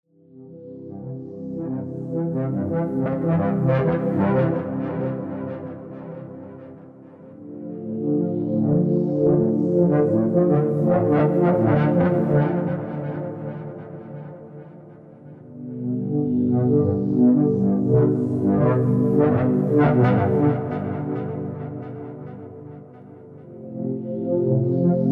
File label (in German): Entspannungsmusik für Babys